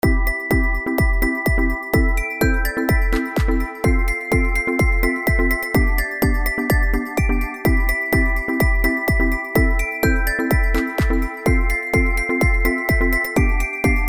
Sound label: Jingles Bells Ringtone